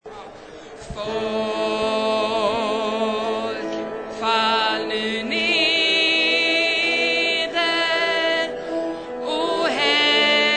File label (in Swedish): bas